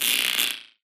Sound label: bullet energy titan shield spang cluster